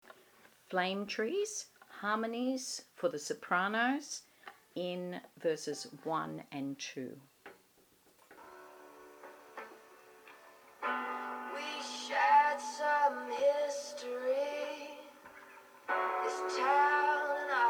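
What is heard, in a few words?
Soprano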